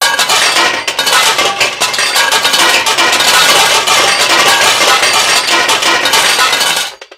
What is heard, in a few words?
fix machine; pierce